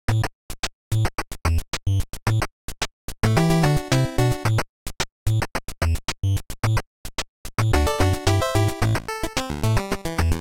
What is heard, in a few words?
funkotronic beat